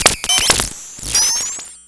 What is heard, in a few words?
Cwejman S1 - Crystal Making Machine